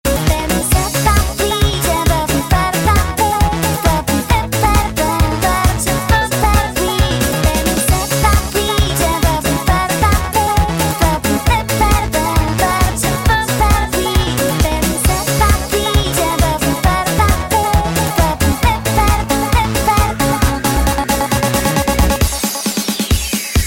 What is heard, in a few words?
Music Song